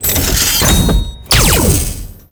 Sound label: Sword Finisher; Teshin